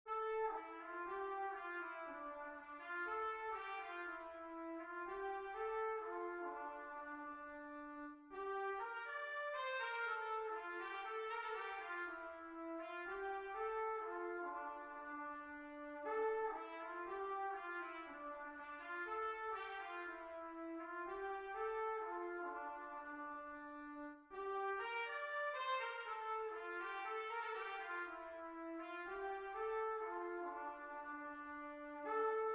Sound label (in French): Trompette en Sib; Tetris